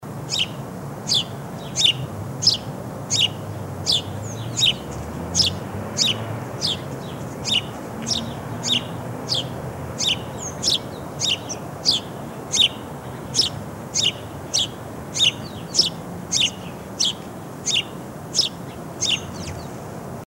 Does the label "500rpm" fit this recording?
No